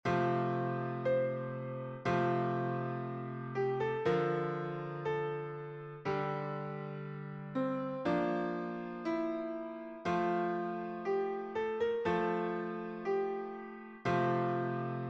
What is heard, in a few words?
piano